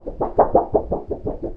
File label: power suriken; Audiosurf; chillout